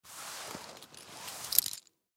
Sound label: Key Ring Pull, Key Ring Pull From Pocket; Rustling Sound Effects; Rustling (Sound Effects:Objects); Blastwave; Foley